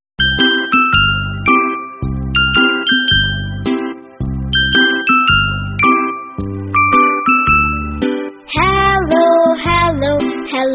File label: Hello Song